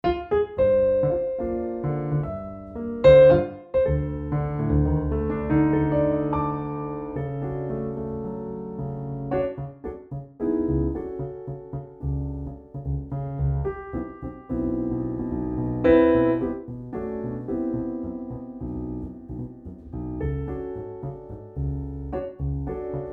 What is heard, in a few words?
CFX Aud, garritan cfx concert grand; garritan cfx concert grand aria player presets